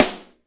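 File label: snare drum, acoustic drum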